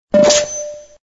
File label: sound water one line